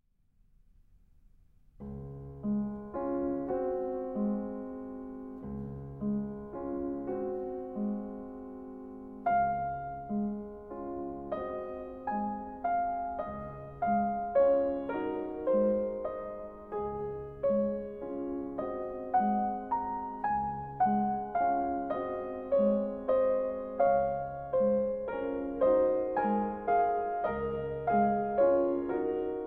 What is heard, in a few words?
Berceuse in D Flat Major; Op. 57